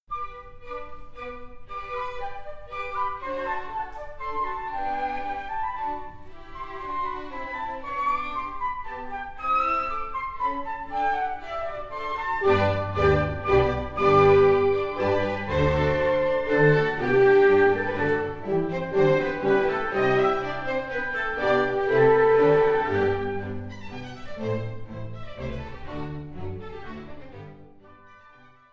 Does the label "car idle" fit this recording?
No